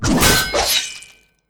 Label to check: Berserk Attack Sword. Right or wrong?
Right